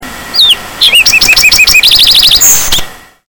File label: fox